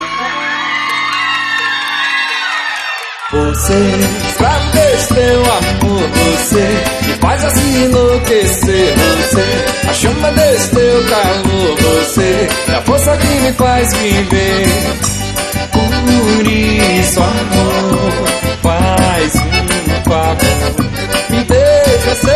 xote baião ao vivo

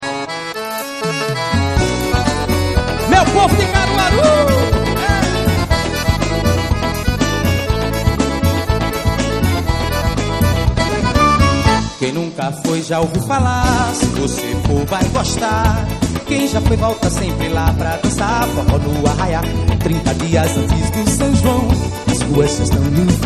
forro

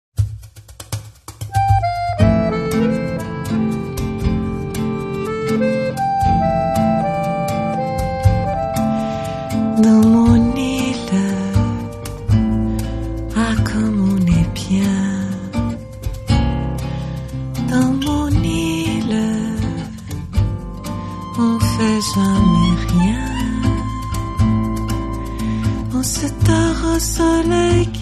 harpa bossa

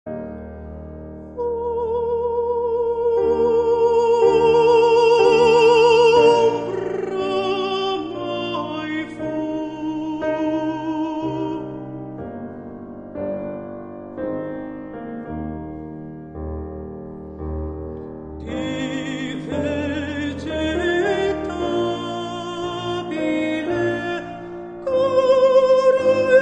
contratenor

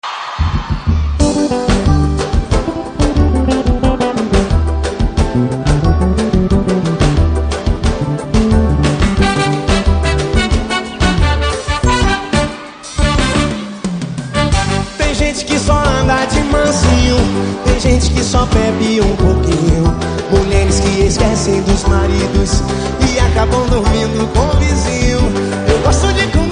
samba ao vivo em Salvador

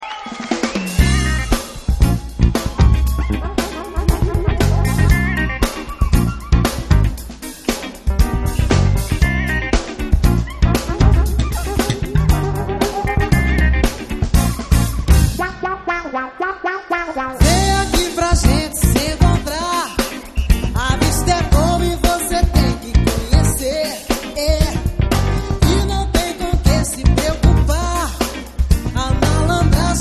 samba

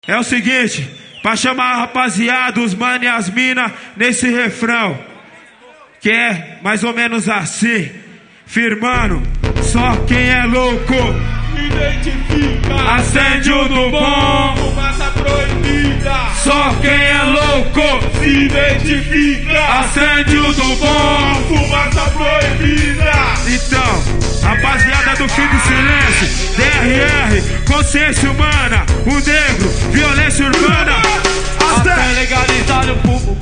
rap ao vivo; sampler rap

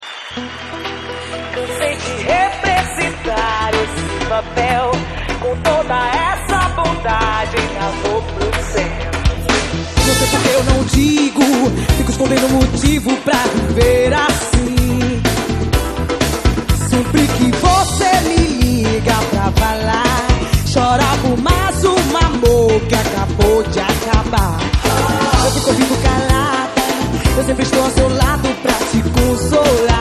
ao vivo em Salvador

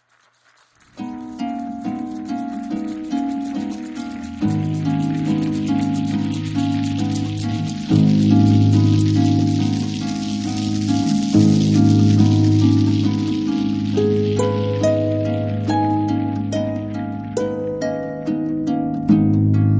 harpa bossa

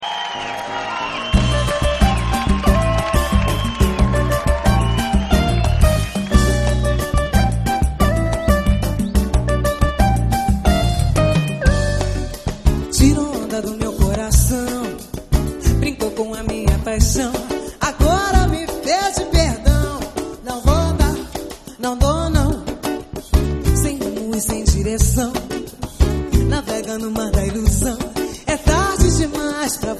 samba

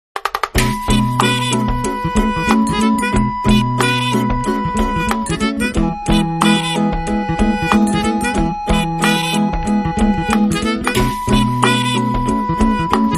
musica de brinquedo

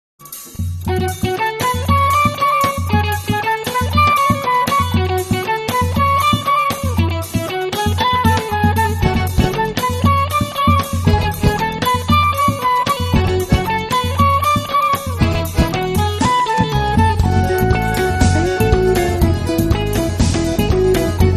forro

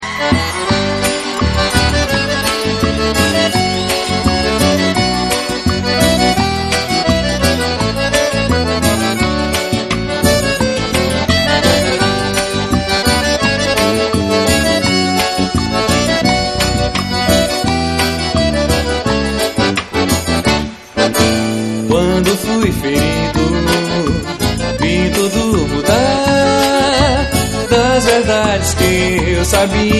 xote baião ao vivo

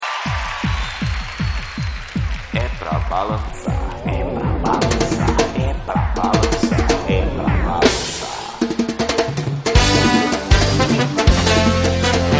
ao vivo em Salvador

